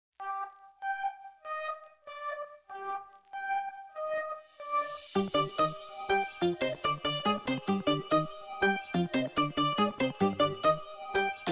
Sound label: Instrumental English Ringtones